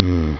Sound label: HUMM; BattleSNDS